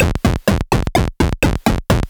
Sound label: Raw Glitch